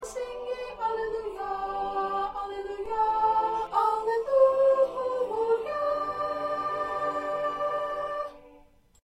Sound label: 2nd Soprano